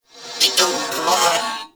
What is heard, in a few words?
Purgatory Warrior Possess Scream; Warframe